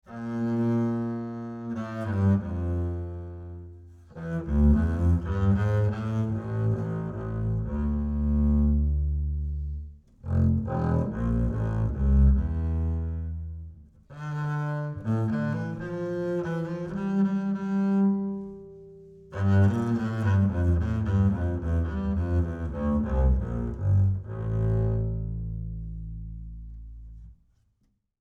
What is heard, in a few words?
Ac Bass 50cm C Bowed Low